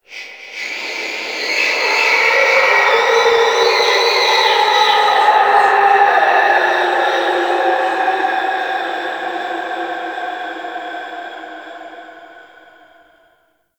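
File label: Shipyards Distant Cry, Ambience; Warframe; Grineer Shipyards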